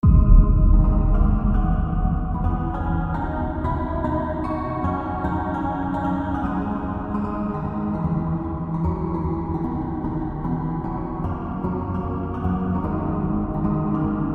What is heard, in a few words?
(Instrumental)